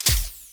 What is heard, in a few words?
MOVE; C64 Dreams; Multi-Sound Default